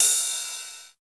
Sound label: CYM RID, Cheez Rides; Cheez Cyms